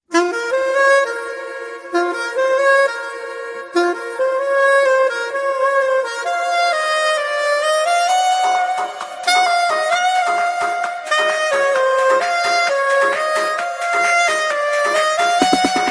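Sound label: business ringtone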